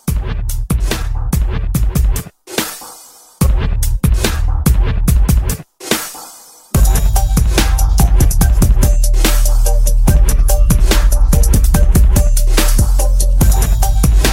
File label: Mixshow Re Drum, 72